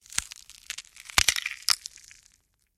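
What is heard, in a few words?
mummy bones sound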